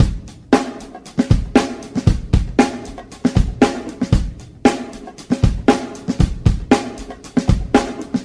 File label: Drum loops, Funky